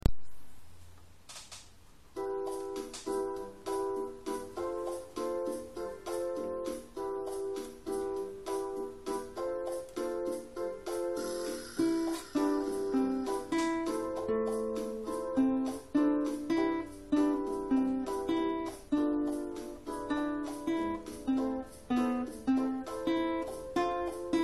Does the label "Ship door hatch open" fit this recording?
No